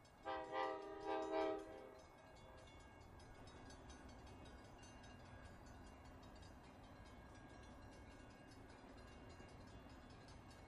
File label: Train By Med Speed, bys